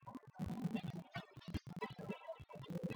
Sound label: Latino